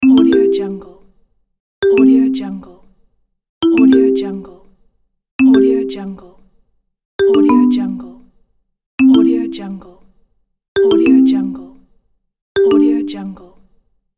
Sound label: marimba notification message